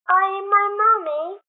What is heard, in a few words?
child left; garrysmod; mikes grave yard